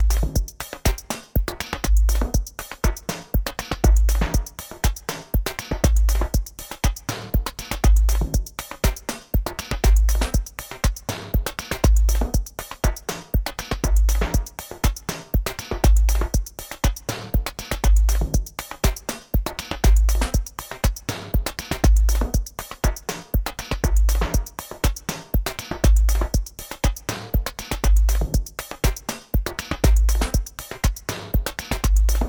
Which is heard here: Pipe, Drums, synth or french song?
Drums